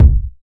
original bass drum single shot a sharp key, bass drum sound tuned to the A# note